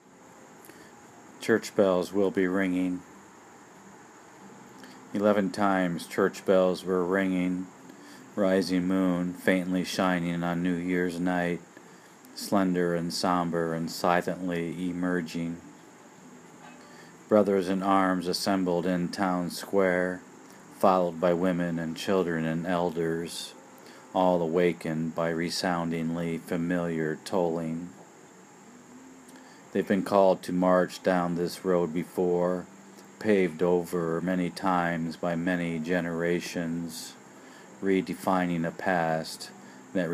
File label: church bells will be ringing; eleven times church bells were ringing rising moon faintly shining on new year's night